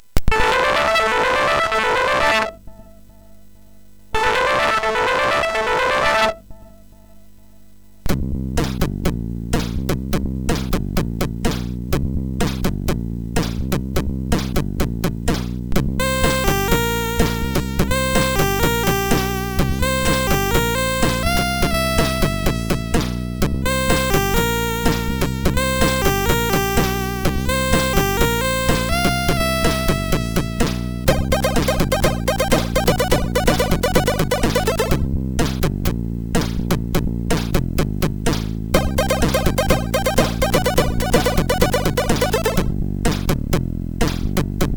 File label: Heavybass, sid MOS6581R4